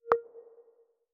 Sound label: Dock; Essential PH-1